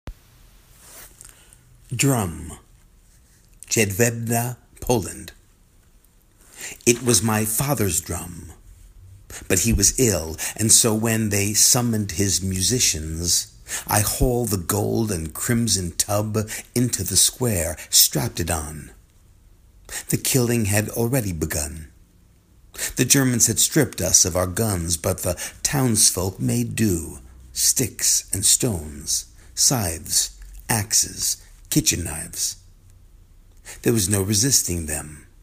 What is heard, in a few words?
Drum; Pedestal Ratiner